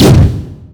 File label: Slam Sound; Ganondorf